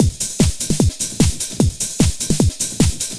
LOOP, Eurotech; Sounds Good

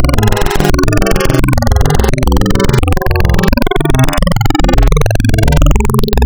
Spectral, wavetables; Architecture 32

